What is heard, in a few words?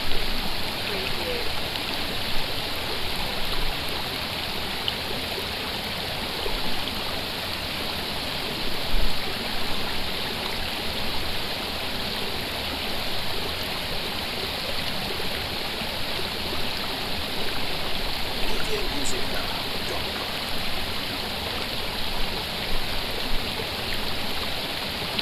River Loop, Afon Nadroedd, Llanwddyn